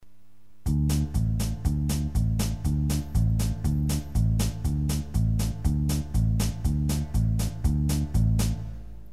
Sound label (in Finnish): humppa